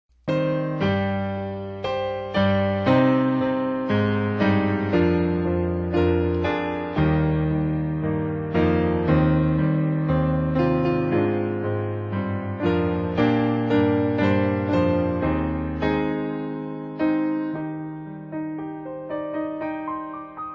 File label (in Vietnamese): BASS